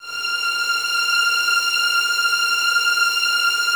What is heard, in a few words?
VNS F 6, Violins, String Ensembles; Miroslav Vitous